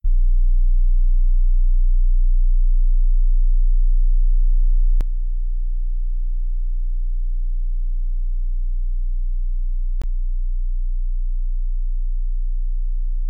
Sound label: Test sine 30Hz @ -10dB; woofer